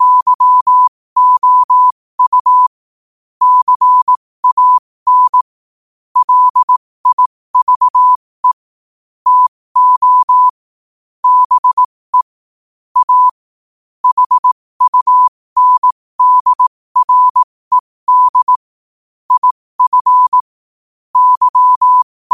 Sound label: 5 wpm, morse